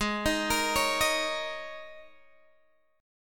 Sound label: Ab Suspended 2nd Suspended 4th Ab down Guitar Open G